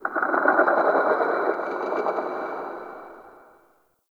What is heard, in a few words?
Under City Texture Mid Croak